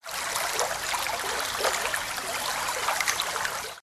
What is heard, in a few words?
Water Sms Ringtone